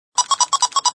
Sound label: Electronic beeps from handheld device; Beeps (Sound Effects:Multimedia)